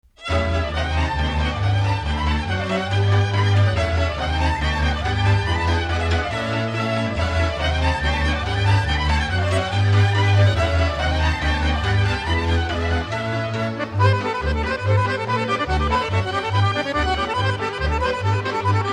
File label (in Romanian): acordeon, Jieneasca